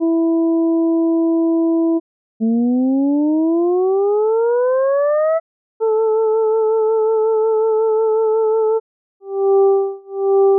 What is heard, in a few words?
continuous control studies, wavetable synthesis